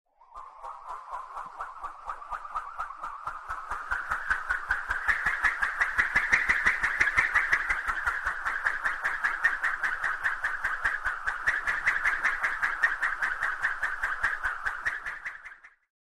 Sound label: Fast Wire Swishes; swish, cartoon